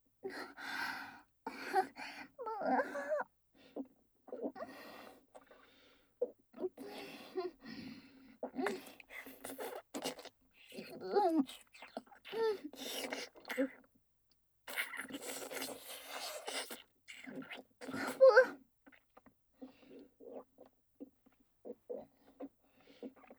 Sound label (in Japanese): フェラループトラック, ASMR; カースト上位JKのじゅぽじゅぽ; スクールカースト上位の生意気JKを催眠分からせ調教～彼氏大好きヒナちゃん♪大事な処女をスマホ催眠で敗北献上→求愛メロメロおま◯こで中出し懇願アヘ絶頂♪～